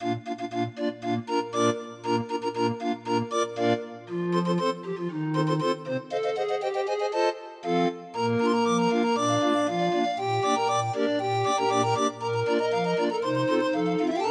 31 note, Grind Organ; Anchors Away

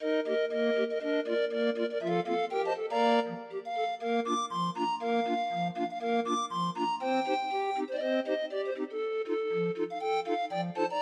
Jingle Bells Swing, Grind Organ; Demo of 20 note MIDI file

